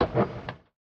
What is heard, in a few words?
pumpjack inventory pickup; factorio 2.0.55